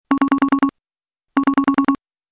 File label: Business Tones